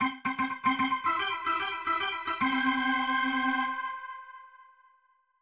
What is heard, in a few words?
Organ; World Series Baseball 2K1; Dreamcast